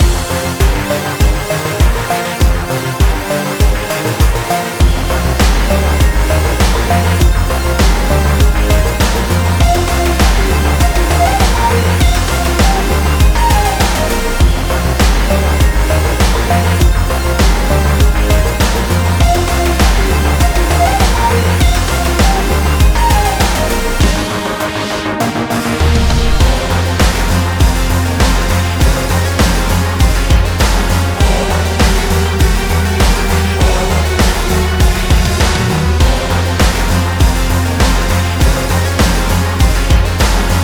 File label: Burning-Hot Beats